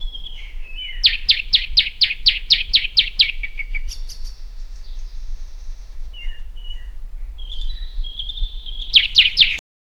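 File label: birds chirping in a forest; voice over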